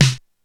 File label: Snare, Korg DSS-1